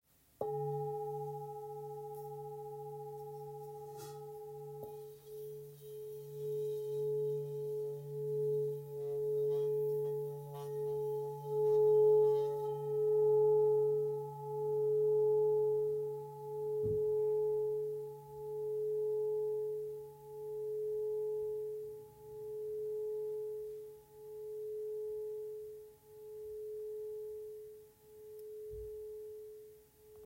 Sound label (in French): Bol full moon Do diese 1,71